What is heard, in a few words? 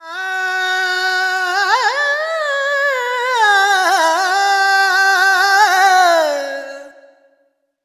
Expression, Vocals Male World Pop; Sonic Foundry (Sony Creative Software)